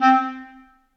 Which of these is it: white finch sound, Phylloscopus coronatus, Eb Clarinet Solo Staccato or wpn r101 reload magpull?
Eb Clarinet Solo Staccato